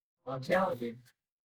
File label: feedback confirm